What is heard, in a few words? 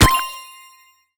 sparks wild Attention; netent